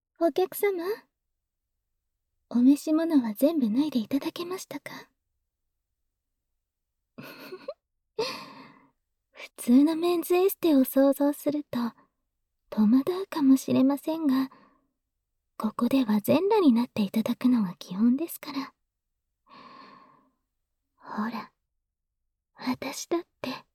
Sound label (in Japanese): 優しく愛撫マッサージ; 触手エステ